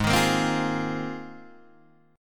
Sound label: G sharp 6th Add 9th, Guitar, Open D; down; chord shape